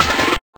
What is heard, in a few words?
Fill, Snare Fills